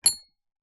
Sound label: leisure toy musical xylophone key plastic press single note